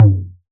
low tr 808 electronic tom sample f sharp key

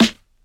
00s fluffy snare single hit a key; snare-drum-samples

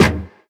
smooth bottom end acoustic snare sample e key

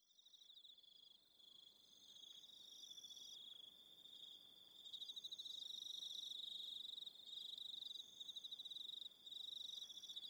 crickets - kiwi and ruru birds

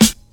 2000s clicky hip hop snare one shot g sharp key; hip hop snare drums

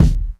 sharp kick drum sample D# key

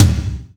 verby tom drum c sharp key

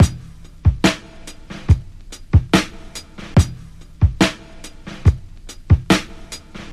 71 bpm drum loop f key

